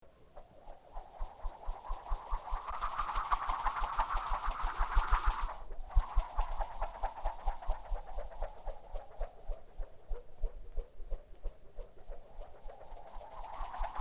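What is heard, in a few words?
vrashenie v vozduhe, spinning in the air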